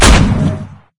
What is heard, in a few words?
oicw alt fire; svencoop; hl2